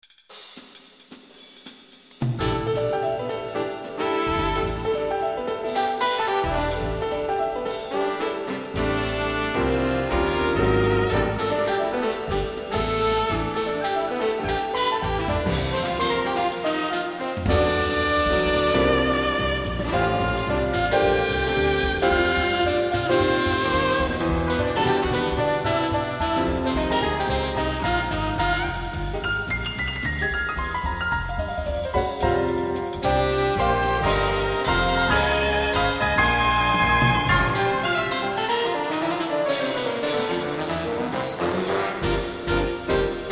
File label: AMBIANCE Jazz Quintet; Soundfont